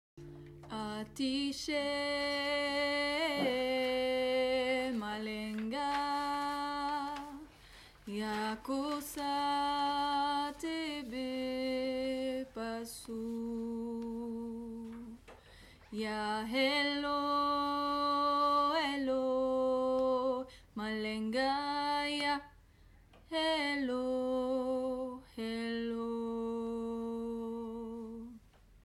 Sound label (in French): atishé basse